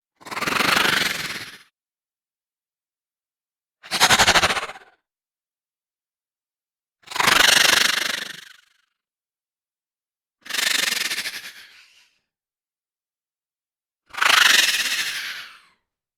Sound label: animal hiss and rattle